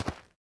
dirt step; Golden Crayon Sounds

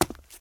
cement step; Original TK Sounds

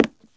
hardwood step; Golden Crayon Sounds

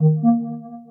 page start loading; ChromeVox Classic Sounds

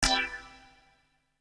Web Page Loaded Alternate; Salem's Sound Schemes

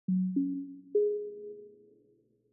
Windows Startup; Windows 11; Dark

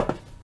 metal step; Golden Crayon Sounds

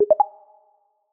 Calibration tone success; iOS Sounds

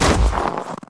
snow hard land; Original TK Sounds

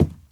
carpet step; Golden Crayon Sounds

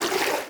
swim step; Golden Crayon Sounds

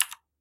keyboard type; Golden Crayon Sounds

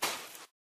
sand step; Golden Crayon Sounds

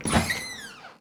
door open; Golden Crayon Sounds

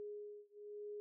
scan loop; iOS Sounds

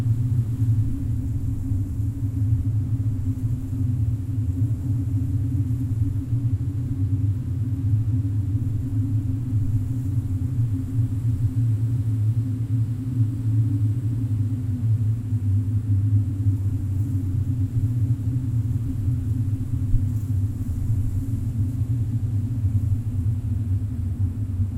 tunnel; Golden Crayon Sounds

